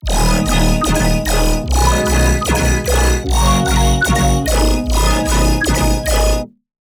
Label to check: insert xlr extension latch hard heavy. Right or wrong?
Wrong